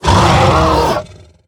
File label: Bronze Herald Attack